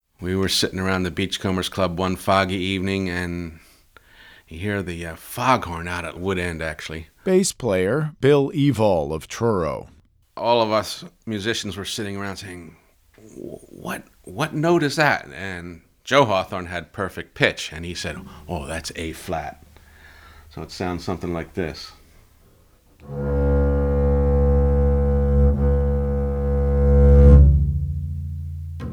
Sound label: bass player